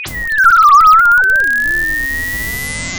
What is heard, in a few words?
pop